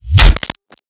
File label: Fat Splash; Plastic Surgery